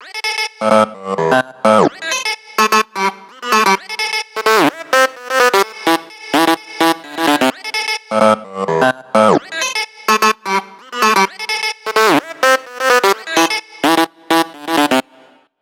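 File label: 128 BPM Drop Leads SC, Synth, A minor; Vengeance; Essential Clubsounds Vol.5; Highway Kit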